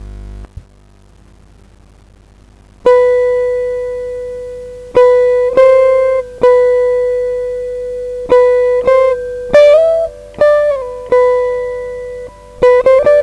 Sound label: riff